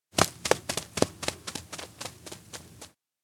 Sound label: steps outdoor run; jet; anemoi